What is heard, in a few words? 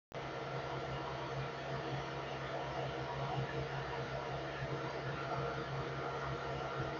no muffle high, 3dprinter fan noise